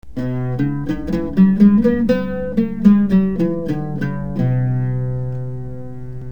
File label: nahawand, oud